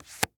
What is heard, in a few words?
card flip